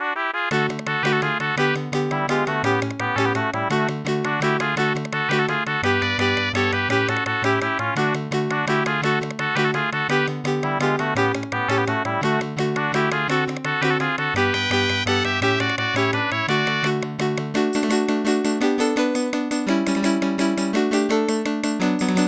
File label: Mariachi (Aircheck, Hi-Fi)